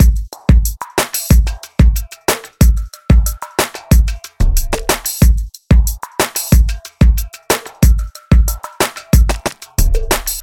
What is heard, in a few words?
Jamaican Reggae Beat